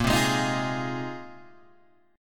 A sharp 7th Suspended 2nd Suspended 4th, Guitar, Open G